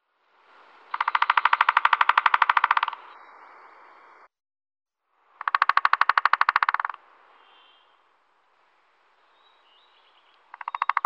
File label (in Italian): Picchio Tridattilo Picoides tridactylus; Tamburellare; Versi Animali; Il Forum della Fotografia Naturalistica